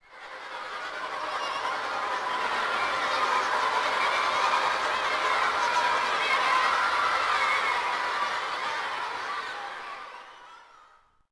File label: City Crowd; Ambient; Sid Meier's Civilization 4 Complete; Beyond the Sword